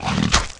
spit, aliens mutant

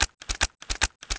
reload shells, m590; Soldier Of Fortune II Double Helix; XBOX

